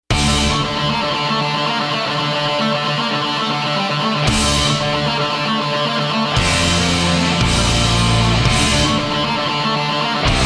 Rock

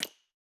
Generic UI

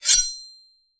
Ninja Attack Metal

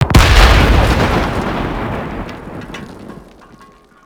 Exp Tank Far; Mars Sortie

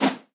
Zombie Miner Throw; gamerip

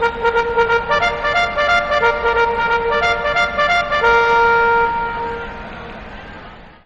Basketball

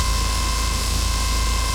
Laser; Ion Shift

